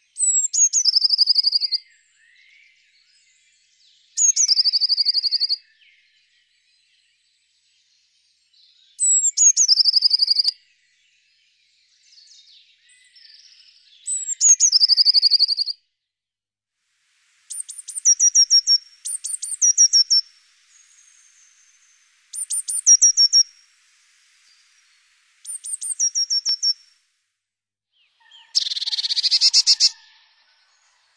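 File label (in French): Mesange bleue, oiseaux; birds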